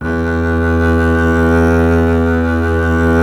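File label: strings contrabass E; Miroslav Vitous; Solo Instruments VOL-2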